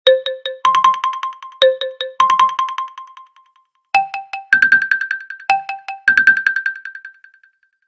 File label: Bounce, ringtones; OnePlus